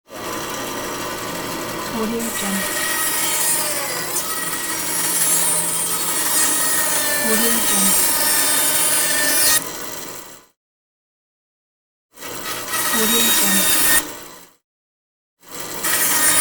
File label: band saw cutting